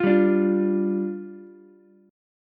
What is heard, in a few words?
D Minor Double Flat 5th, down, Ukulele; Dmbb5; strummed; JGuitar